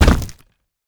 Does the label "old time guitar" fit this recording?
No